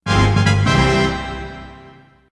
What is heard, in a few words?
fanfare; WoWInterface; AllTheThings